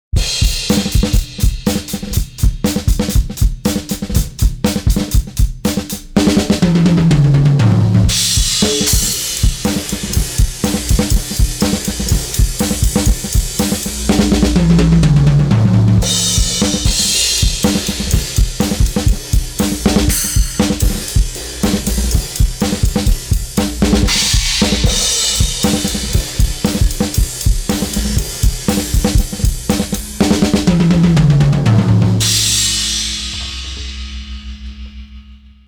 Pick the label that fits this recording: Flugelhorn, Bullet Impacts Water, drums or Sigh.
drums